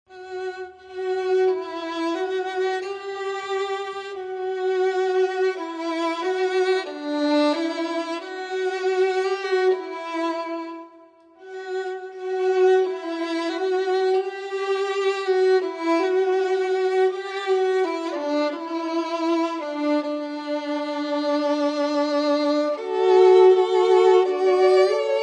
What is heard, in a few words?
Strings